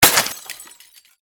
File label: Warfare, Bullet, Impact, Glass; Combat